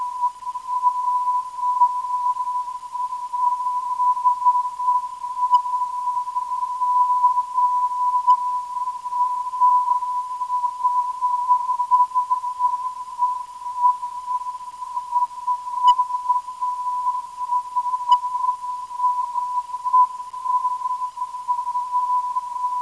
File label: 635kHz, NDB